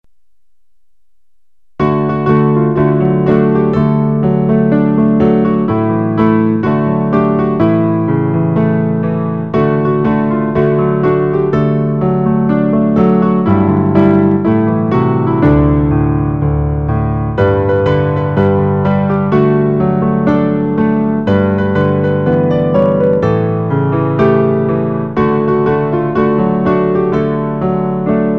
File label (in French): piano